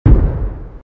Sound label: explosion